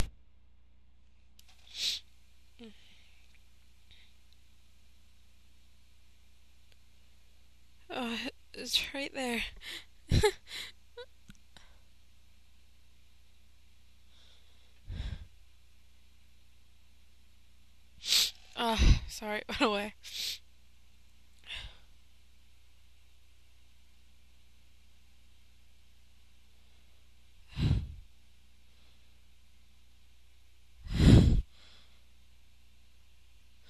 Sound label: implosion, female